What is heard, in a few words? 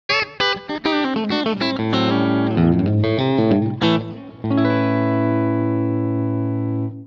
Dualtone cut, guitar